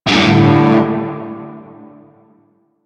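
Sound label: Shawzin Pentatonic Major Chord J; Music; Warframe; Narmer Shawzin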